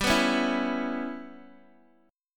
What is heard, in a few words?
G 6th Flat 5th, Guitar, Open D